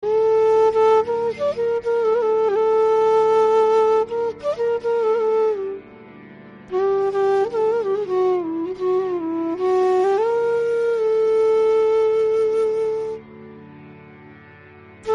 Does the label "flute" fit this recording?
Yes